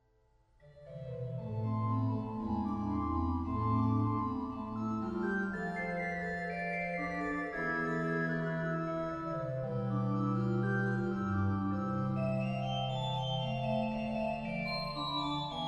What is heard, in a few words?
orgue; Georg Bohm; Partita; Wer nur den lieben Gott lasst walten